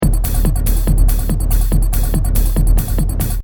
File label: Techno Trance Drum Loop 142 Bpm; Royalty Free Drum Loops